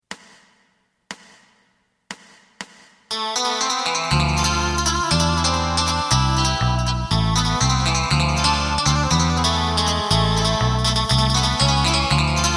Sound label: drum